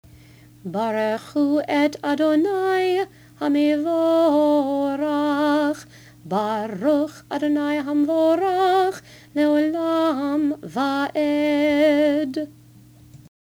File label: Barechu traditional